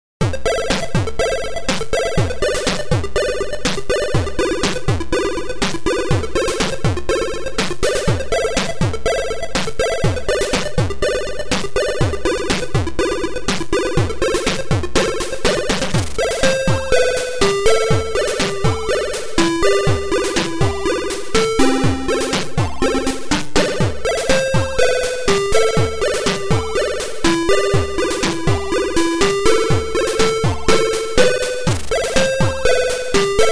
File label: Slow Tune